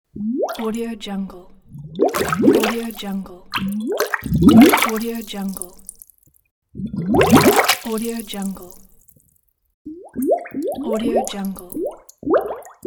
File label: bubbles